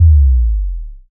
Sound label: sub drop